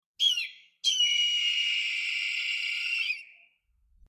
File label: hawk call; weather and nature